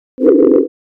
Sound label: cluster sine